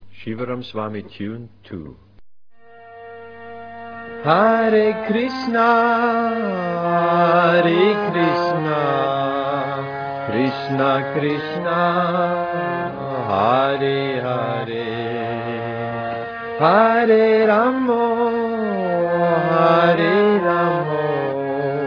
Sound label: tune